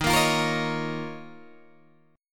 D sharp 7th Suspended 2nd, Guitar, Open D

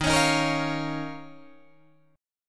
D sharp 7th Suspended 2nd, Guitar, Drop D

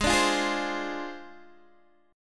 G sharp 6th Flat 5th, Guitar, DADGAD

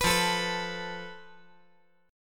F Major Flat 5th

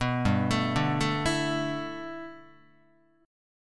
G 6th Add 9th G Guitar, chord; G6add9 arpeggiated

